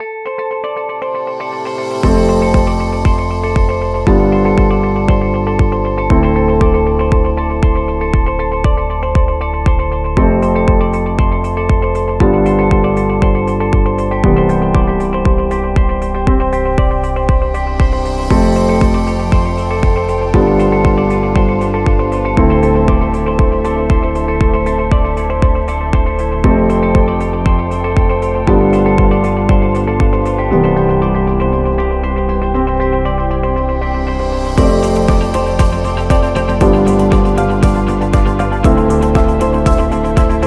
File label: Product Ad; office background